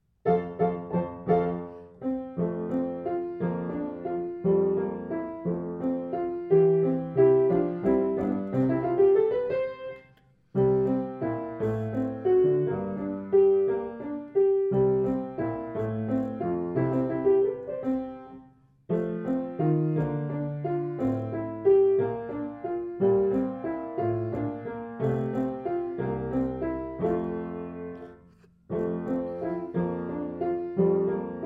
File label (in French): accompagnement; Mozart; evaluation fin de 1er cycle